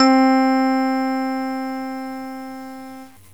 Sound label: E Piano; GMPlay; E Piano 2